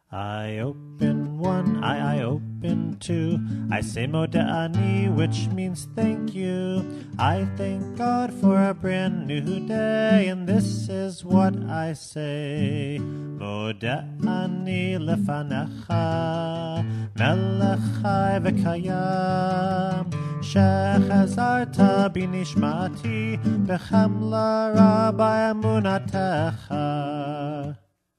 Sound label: Modeh Ani